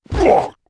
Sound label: monster; ARCANE